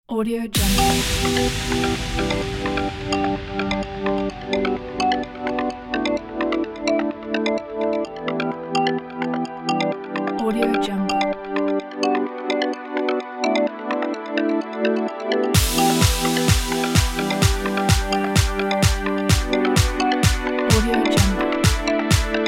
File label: progressive house